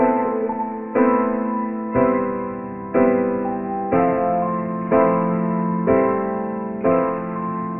piano, Loops Sad

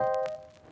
keys

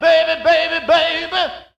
baby baby baby SUNG; ooh ahh

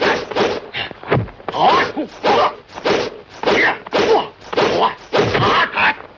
martial arts

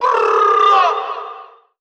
brrrrrr, Voice

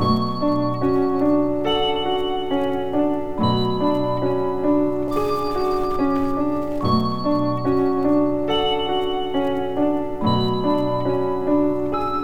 piano, sad

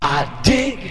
"i dig", SUNG; Voice

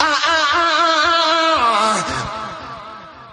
"ah ah ah ah ah uh uh" SUNG; Voice; ooh ahh

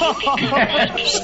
laugh group; ooh ahh

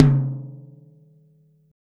Toms

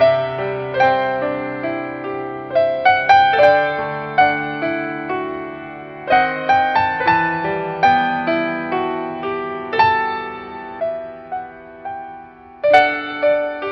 piano, Sad

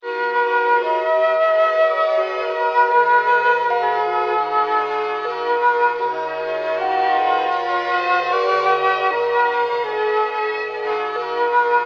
flute present memories, Loops, Jazzmatazz

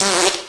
fart short

